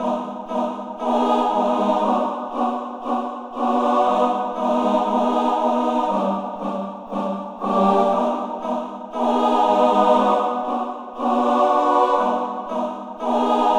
Choir; 118 bpm

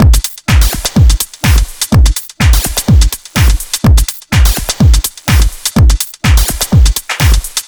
Zippel Beat E, dance

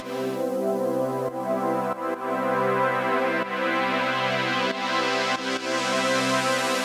Butter Pad A; Trap (Трэп)

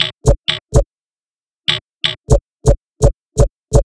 Break Fx 0303; Сэмпл звукового эффекта (Dance, Electro)

